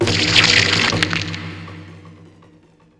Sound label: dark artistry projectile; weapons; dota; grimstroke